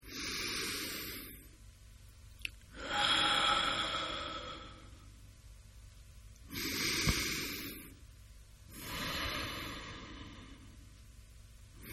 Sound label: Breathe Deep Slow Male; Breathing Sound Effects; Human